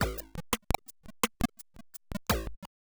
Electronic Percussion

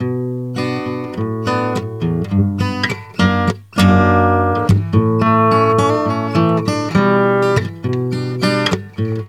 Acoustic Guitar; Guitars

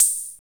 Hi-Hat One Shot; Drums

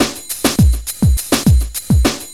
Old School Break, Drums

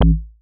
Synth Bass Single Note; Basses

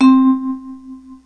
C3 vibes; proteus2

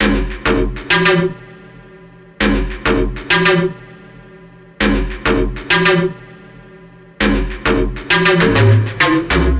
Synth Bass; Basses

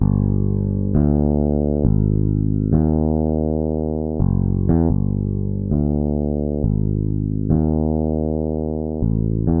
Electric Bass; Basses

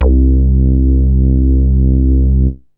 BASS; SoundFactory; SMPMANIA

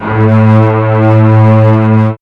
FAT BASS; SoundFactory

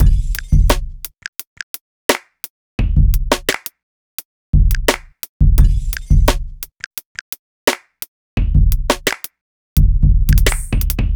Urban Beat, Drums

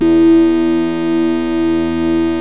buzz solo, yamaha rm1x synth

